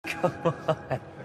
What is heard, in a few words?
Laugh Come On